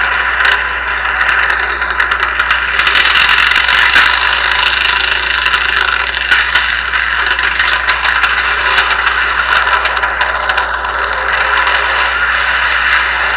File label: purr, bikeweek parade